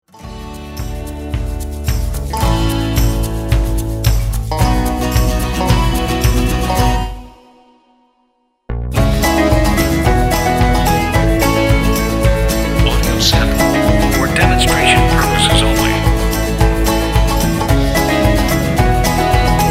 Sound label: ENERGETIC Old Timey Love